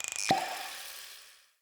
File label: Healing Potion Pickup